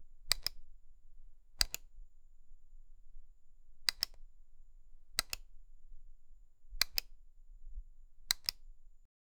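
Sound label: satisfying button switching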